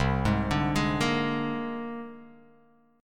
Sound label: C 7th Suspended 2nd Sharp 5th C Guitar Open F; C7sus2#5 arpeggiated; JGuitar